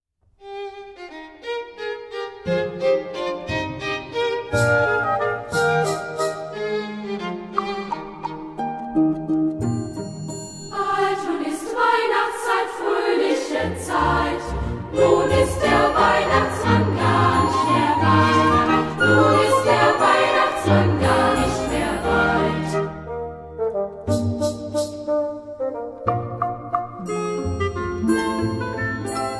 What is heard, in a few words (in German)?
Kinderchor